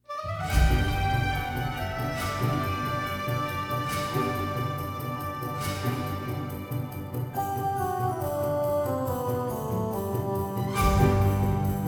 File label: joy to the world non choir